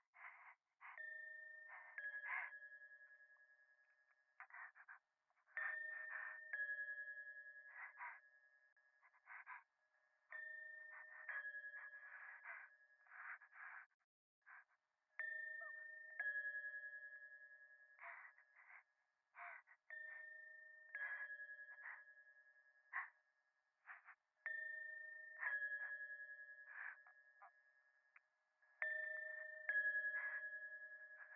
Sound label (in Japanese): 左耳快感吐息 神社